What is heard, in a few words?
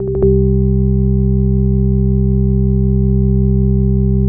C2, ORGANS; House Side Of 2 Step; USB Soundscan vol.51; AKAI